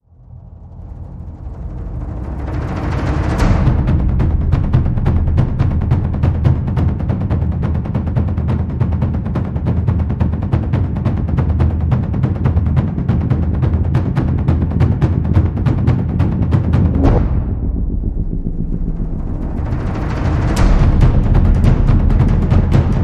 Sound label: Percussion Only